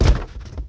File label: chimera napeo die